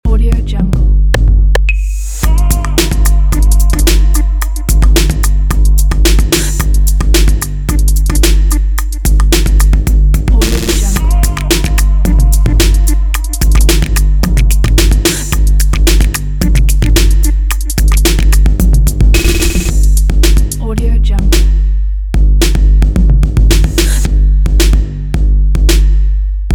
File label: fashion opener